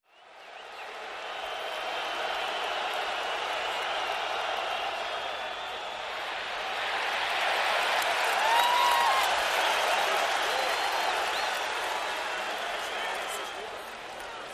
Football Crowd Idle, sports arena football

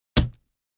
Axe Paneling 2 Side Du, axe hits

